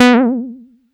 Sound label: Bass Juno H; Vengeance Samples